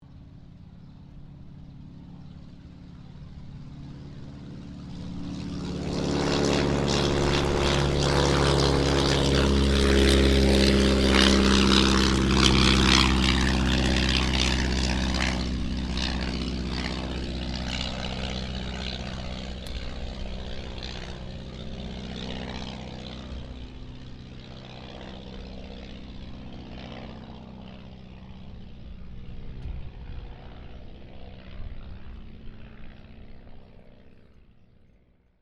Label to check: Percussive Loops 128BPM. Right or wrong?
Wrong